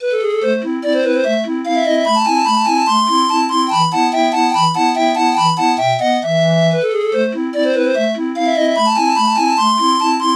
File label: pan flute mozart samples